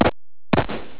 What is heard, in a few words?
rifle